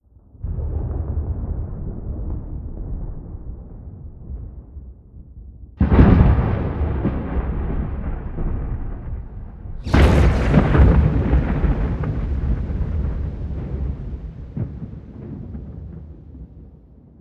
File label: Thunder